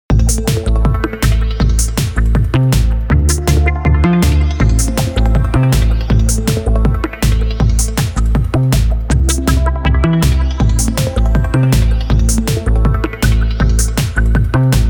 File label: Funky Battle